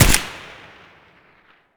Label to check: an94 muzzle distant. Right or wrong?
Right